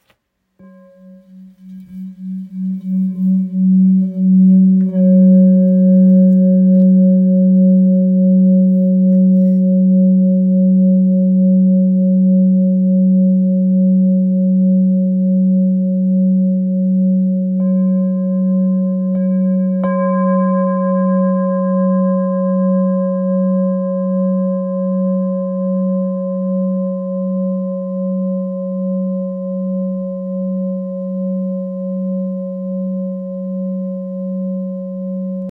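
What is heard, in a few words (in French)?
Bol full moon lingam fa diese, bol chantant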